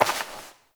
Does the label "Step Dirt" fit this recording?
Yes